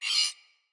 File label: Hud Gear Slide Out